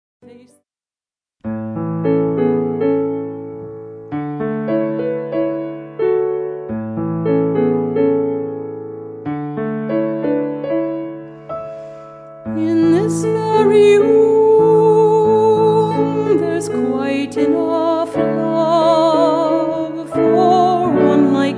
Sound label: Vocalist; Lyrica Demo